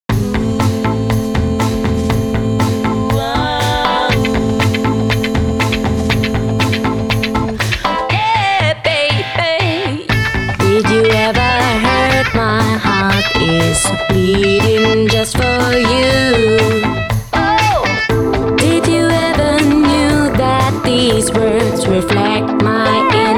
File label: humming, 120 BPM